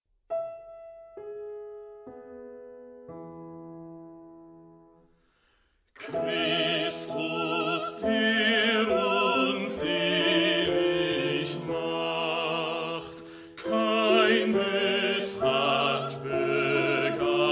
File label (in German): Christus der uns selig macht bas zang